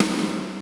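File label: GATE snare, drum; Crypton - DrumBase5000; GATE KITS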